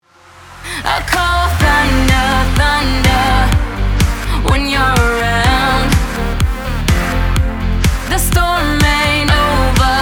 Chart Pop

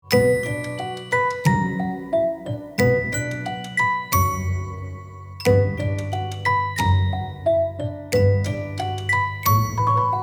Light & Bright Sampler; Light & Bright 2 Sampler

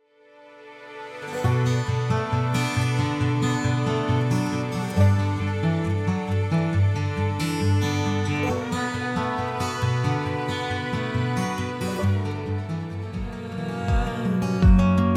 Minimal Folk; Minimal Stories Sampler

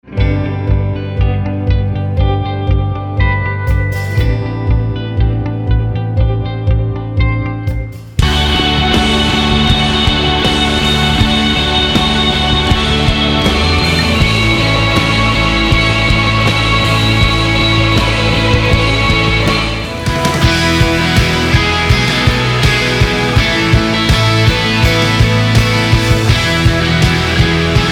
Anthemic Builds

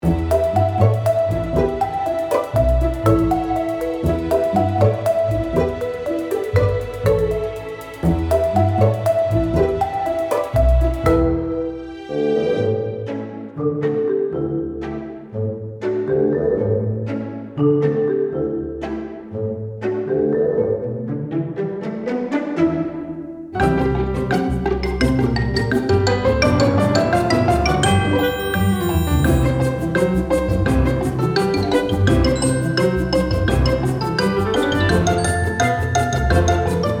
Light and Bright Sampler

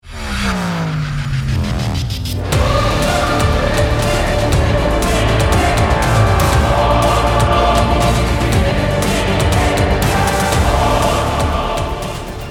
Power Trailers

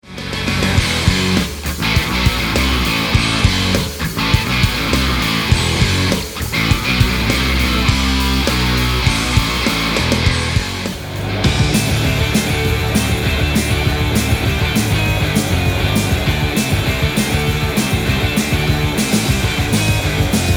Crunch Guitars

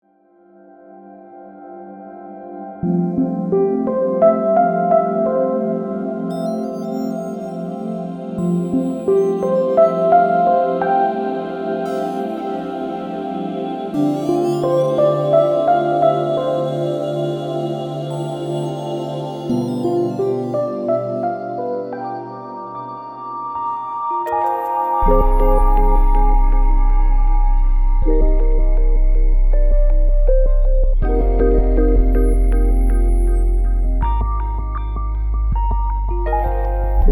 Ambient Atmospherics

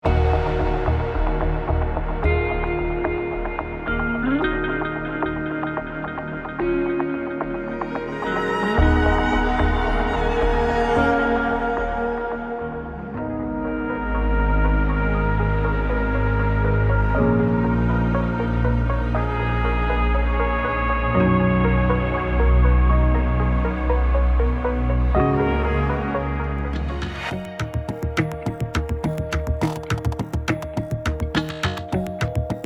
Docu Crime sampler

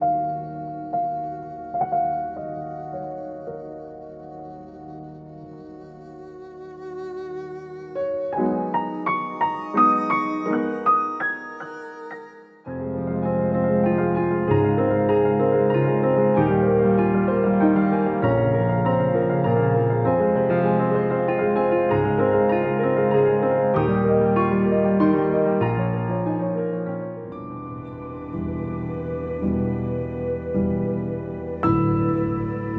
The Piano Works Sampler

